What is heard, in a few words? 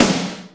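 ambient snare; Greytsounds; Scott Peers Sound Engineering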